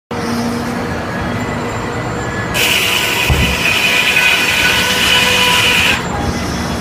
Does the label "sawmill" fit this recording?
Yes